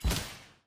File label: Shield Aviator Collect; doodle jump 2; android